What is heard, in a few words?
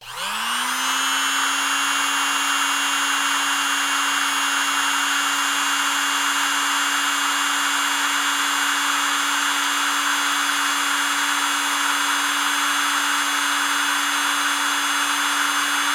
Small Handheld Hair Dryer Noise